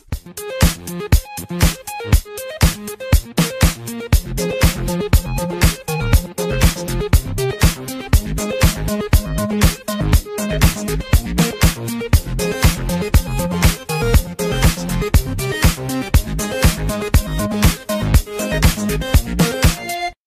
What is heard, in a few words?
Techno Soundtrack